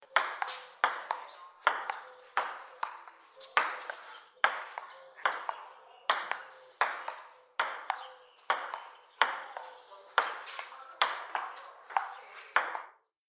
ping pong; sport

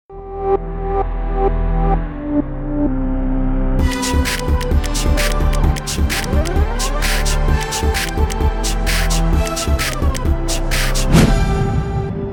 Nice intro music

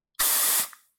deo spray; AXE

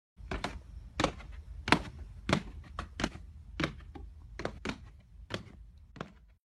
walking down stairs sound; human

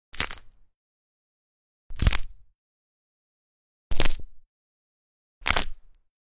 Neck Cracking Sound Effects; ambience

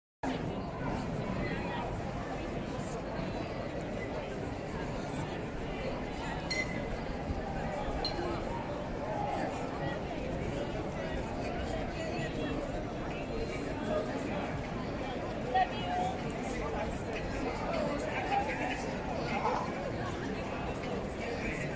Crowded street sound; ambience

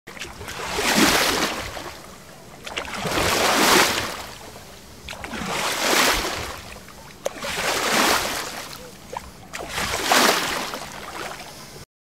Rowing on the sea loop

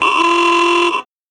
Car beep old

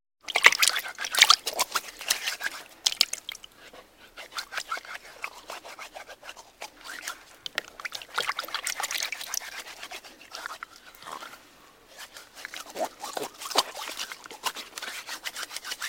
Washing Hand And Face; human

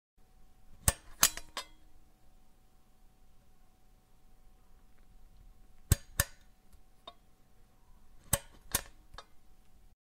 Lamp Turn On And Off; house

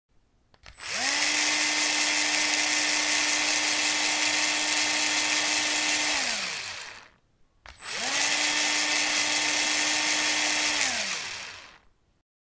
Drill Sound

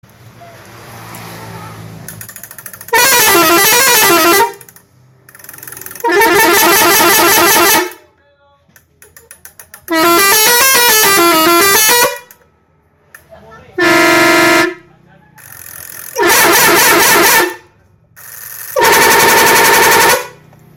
telolet, horn truck

